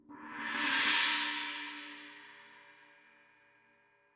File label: china roll, gretsch kit